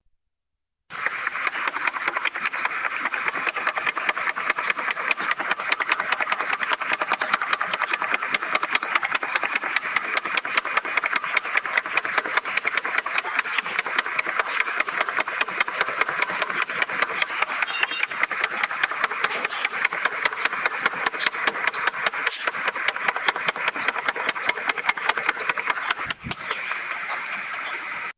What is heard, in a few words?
Siemens Conveyor Belt Near Control Panel